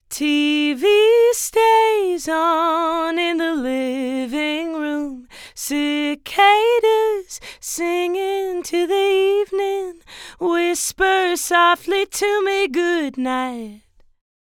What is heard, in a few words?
vocal dual mono hard panned, Dual Mono panned hard left and right; Haas effect; Mono vocal line